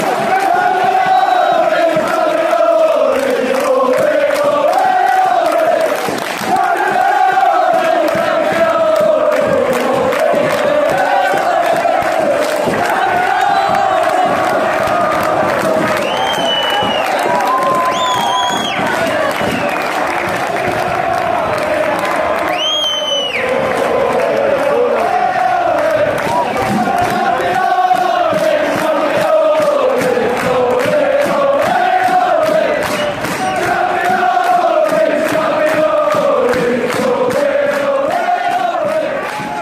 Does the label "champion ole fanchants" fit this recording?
Yes